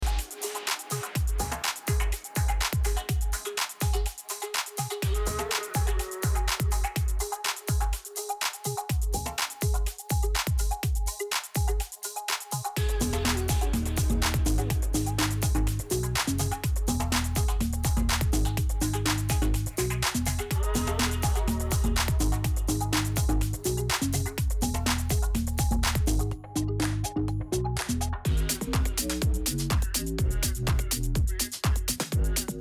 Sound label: MIX API DISTORTION